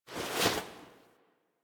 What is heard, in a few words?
Hand Swipe Foley; Warframe; Sacrifice; Sacrifice Lotus Helmet